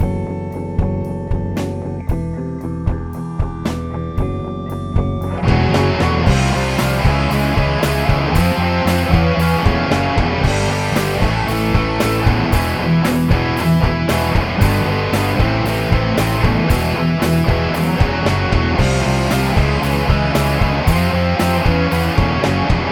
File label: chorus